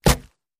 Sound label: Bullet Hits Wood Soft